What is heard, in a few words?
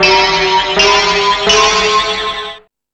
Hip House, Drum Machines E-MU Pro-Cussion